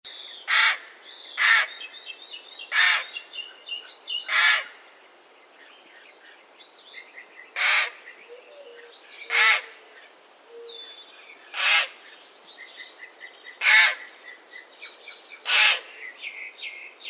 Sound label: Raven Sound